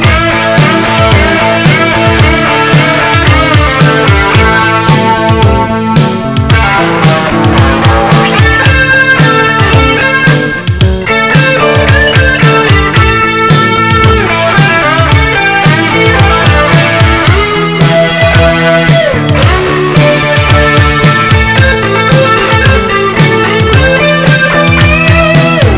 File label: blues in A, guitar